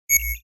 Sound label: Futuristic retro notification